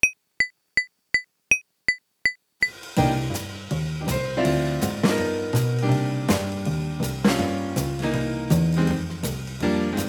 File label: Jazz Improvisation Backing Track